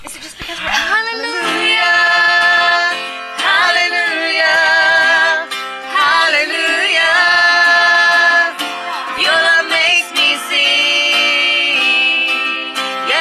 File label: hallelujah alto